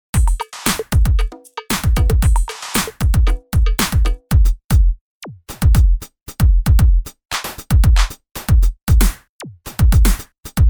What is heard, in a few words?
Hard Breack Kit; live instruments